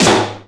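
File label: processed snare, drums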